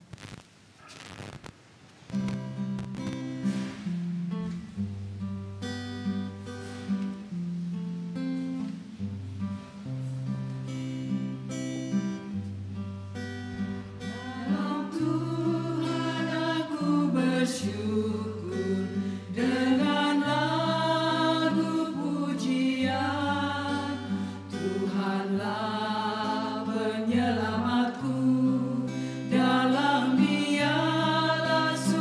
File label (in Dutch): Indonesische Koor; Maramatha; Hoofddorp; Dalam Tuhan aku bersyukur